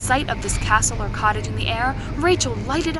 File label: noisy